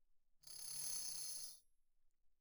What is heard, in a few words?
tambourine thumb roll pp; Percussion